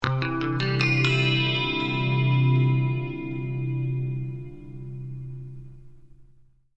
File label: c maj11 chord, guitar